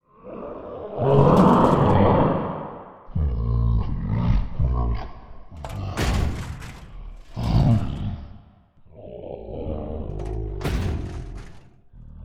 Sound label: orc lord idle; monster